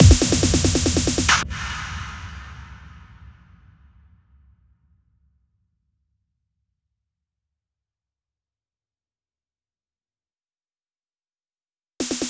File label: Fills, 128 BPM; Vocal-Hook Kits; Rap